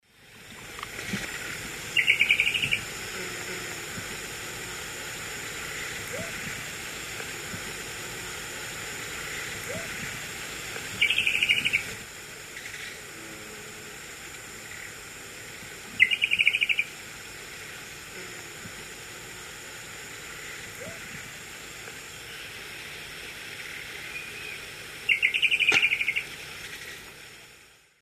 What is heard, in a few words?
Cacicus solitarius, call; S. America; Eastern Lowlands